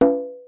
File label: conga